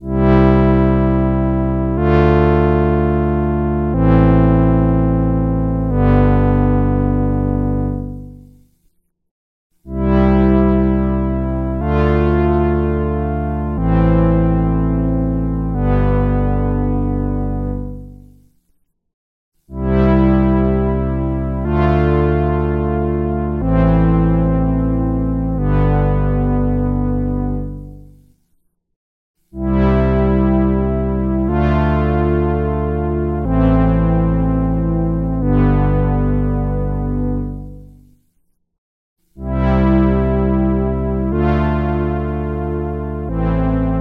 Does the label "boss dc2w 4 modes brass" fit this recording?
Yes